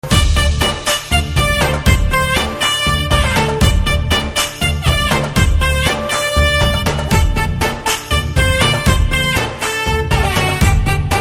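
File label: background Tone Telugu Ringtone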